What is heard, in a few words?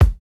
kick, house